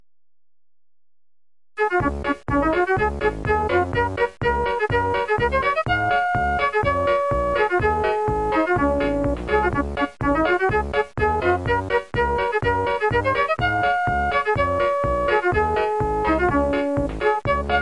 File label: dixie land